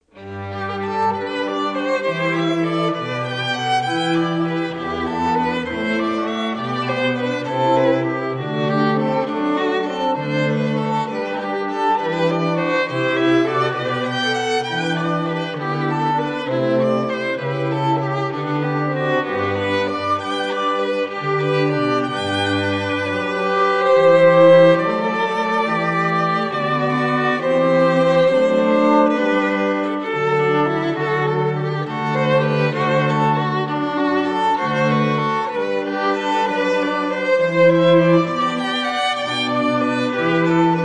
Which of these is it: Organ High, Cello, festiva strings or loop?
festiva strings